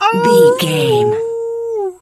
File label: wolf howl short